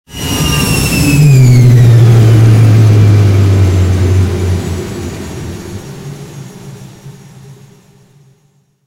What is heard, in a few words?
me163 engines stop; War Thunder